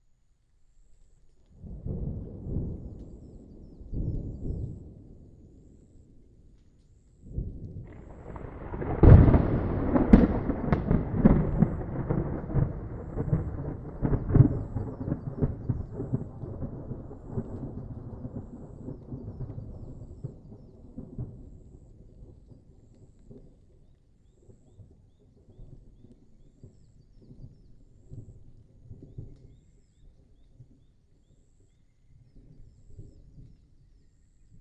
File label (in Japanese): kaminari